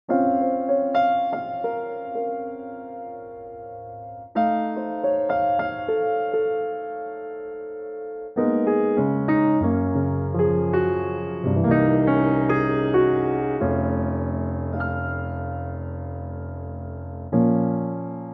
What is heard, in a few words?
Bb Piano